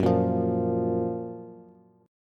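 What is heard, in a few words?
G flat Major 7th G flat Bass; GbM7 strummed; JGuitar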